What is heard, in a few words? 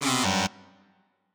Wrong; Banjo-Kazooie